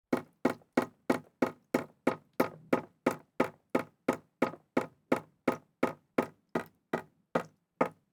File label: LIQUIDS - Drops on plywood fast light; Game Audio Factory; Liquids Materials